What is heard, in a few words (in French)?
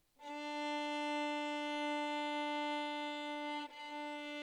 viol re